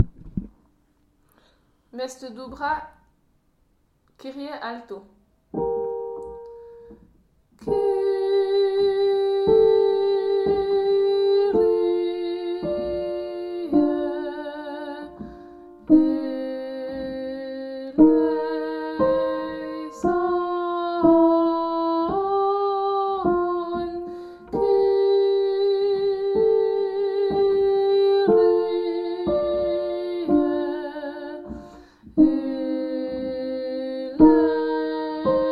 kyrie alto

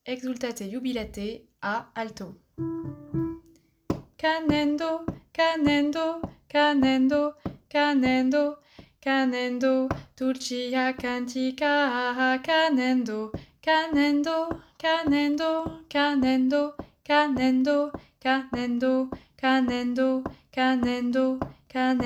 Exultate alto

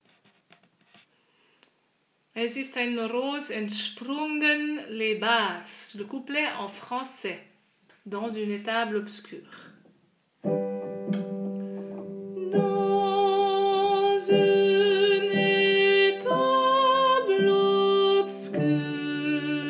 Es Ist Ein Ros entsprungen Basse

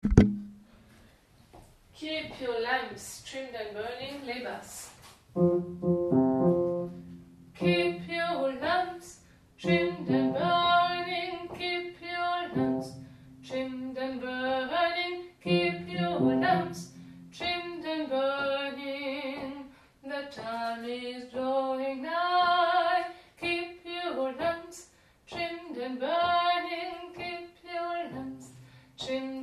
keep your lamps basse

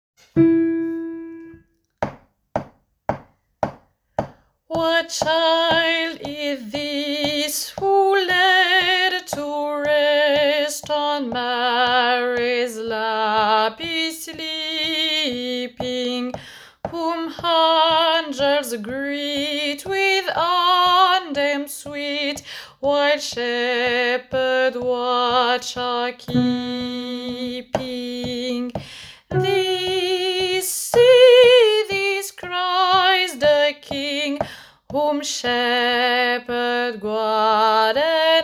What child is this alto

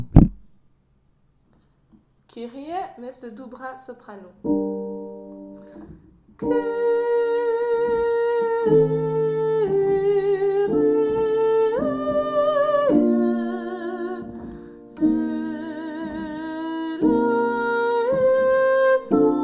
Kyrie dubra soprano

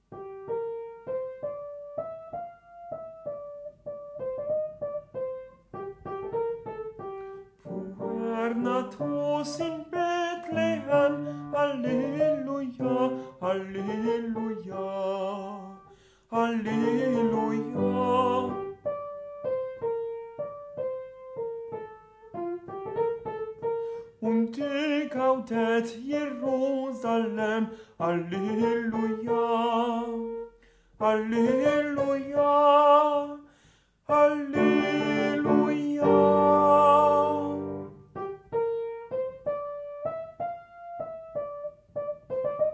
Puer natus in Bethleem S

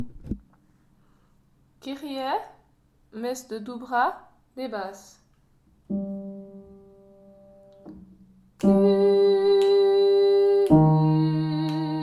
kyrie basse

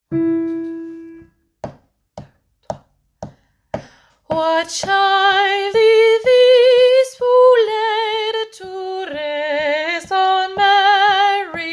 What child is this soprano

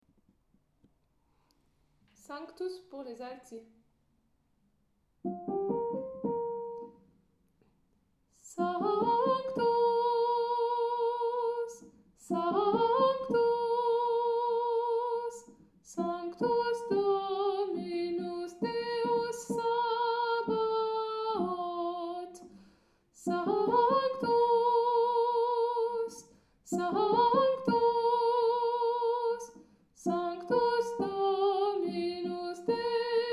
dubra sanctus alt

